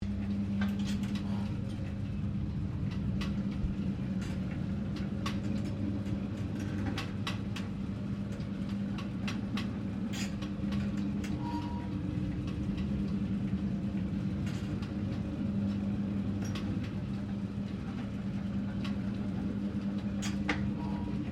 Butter Churn Industrial Butter Factory Operating Rear Loop; Kin Kin Butter Factory; Kitchen